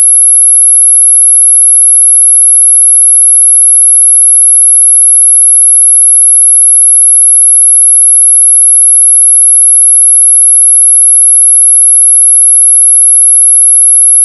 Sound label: alias beat 100